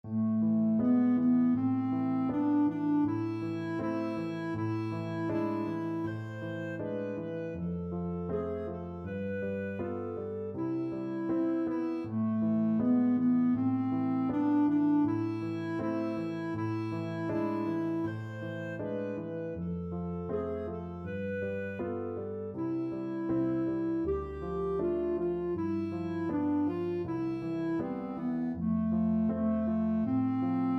Ai kuinka kauniilta kuuluupi with variation, clarinet